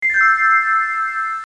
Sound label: Music